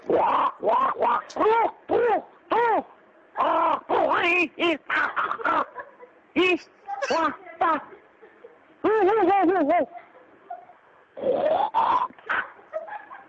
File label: wonk wonk wonk